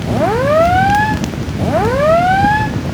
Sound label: ship destroyer damaged defending; Hearts of Iron IV Death or Dishonor